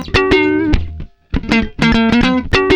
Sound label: lick high; Gtr Chords&Licks; PlatinumPhattCD2; E-MU Producer Series Vol. 8 – Platinum Phatt